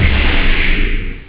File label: make, drums snare; OctaMED SoundStudio V1